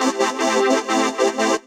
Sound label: 150 A, Melodic Loops; M8; Electronisounds